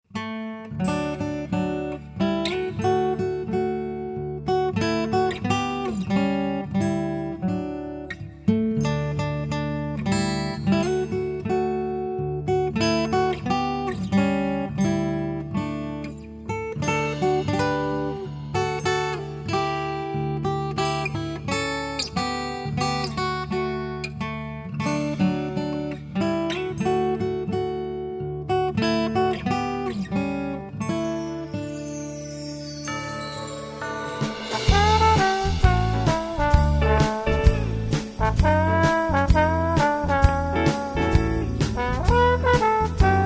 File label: instrumental medley